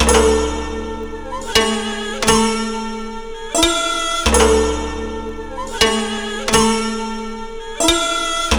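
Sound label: china orchestra; Zero G - Ethnic; CHINA ORCHS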